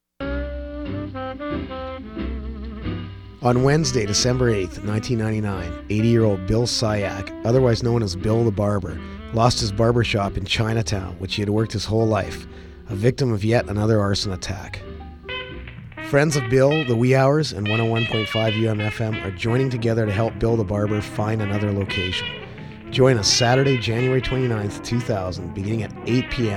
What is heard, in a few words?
jingles